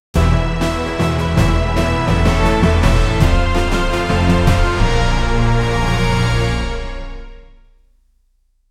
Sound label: short epic mission comple, triumphant